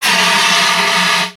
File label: Fire Extinguisher; Daredevil